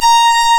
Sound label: Synclav FM STRINGS A#; Big Fish Audio